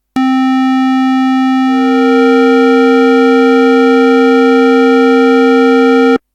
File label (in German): kleine septime; harmonie